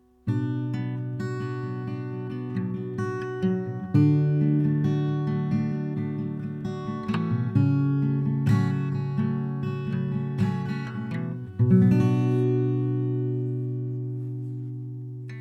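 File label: lewitt lct 840 acoustic guitar; Lewitt LCT 840 — это конденсаторный, широкомембранный ламповый микрофон за 1300$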